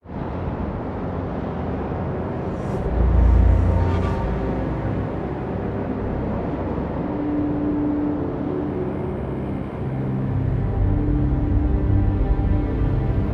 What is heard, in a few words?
Horror; Sherlock Holmes The Awakened